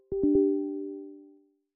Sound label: User Account Control, Windows Sounds; Microsoft; Windows 10x